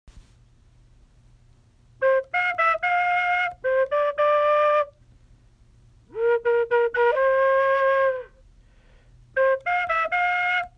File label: Bamboo Flute